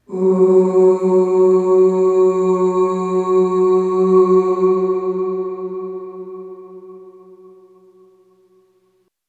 Ooh Long F#2 Long Reverb; Harmonic Building Blocks

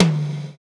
tom, Roland; Club-50 - Foundations Roland; Ext.Snare 3

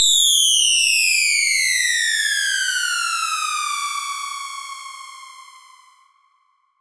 Sonic Fall, FX Atmospheres; Zero-G; Soundclash Nu Dance Electro; Acidized Wavs

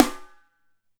Snare, Alesis DM5; Drums

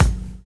WHISPER

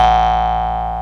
BOING; Syntec; Wall of Sounds; TECHNO-BOX

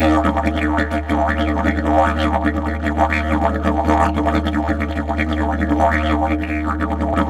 EF BURBLE, Didgeridoo; Big Fish Audio - Didgeridoo and Other Primitive Instruments; BURBLDRONE

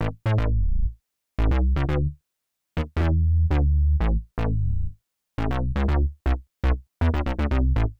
Synth Bass A; PowerFX - Nu Jazz House

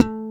CHOP A2, BASS; AKAI S-Series CD-ROM Sound Library VOL-2; MX.BASS

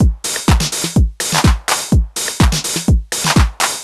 drum loop switch full 125, Classic Chicago House; Sample Magic

